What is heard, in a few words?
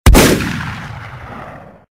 shotgun shoot; outbreaksurvival